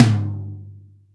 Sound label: TOM, Drums; Best Service; Real Mega Drums VOL-1